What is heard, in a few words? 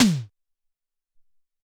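Hex Snare, Alesis SR-18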